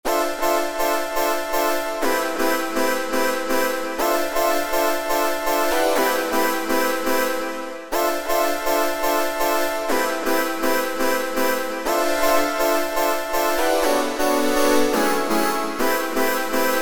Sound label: SYNTH TEXTURES - Chords; Zanzibar Chords; Apple GarageBand 2